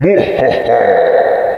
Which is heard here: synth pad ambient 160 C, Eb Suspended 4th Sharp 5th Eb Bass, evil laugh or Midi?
evil laugh